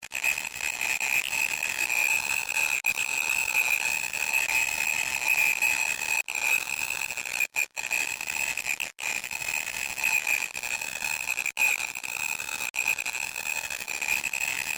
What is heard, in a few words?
Transmission Static, Scifi, Transmission, Static, Radio, Science, Fiction; Communication Sound Effects; BlastWave